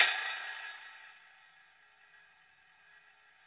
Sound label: RECO, RecoReco; Club-50 - Foundations Roland; ExotcMetal